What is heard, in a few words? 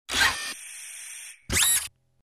Mechanical Servos